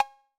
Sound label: Cowbell 808 Color Short